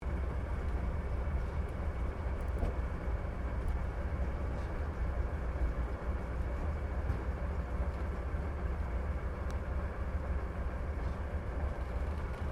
AIRCRAFT CAC CA-25 WINJEEL TAXI INTERIOR, fighter trainer; RAAF Winjeel; Airplanes (Sound Effects:Vehicles)